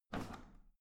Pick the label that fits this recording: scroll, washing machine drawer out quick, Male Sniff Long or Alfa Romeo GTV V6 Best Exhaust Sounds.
washing machine drawer out quick